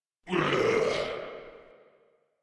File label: Death, Grineer Male